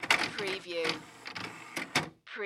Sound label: DVD Player Change Disc; sound effects bible